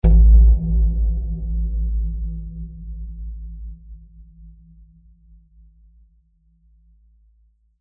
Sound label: Drum Hit Tribal, Drum Hit Tribal Reverb Low Bass; Drum Kit; Blastwave